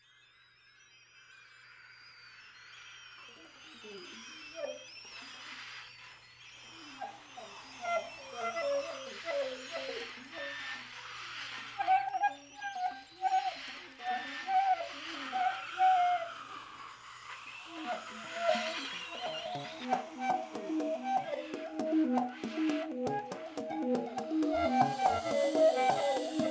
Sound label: alto saxophone and electronics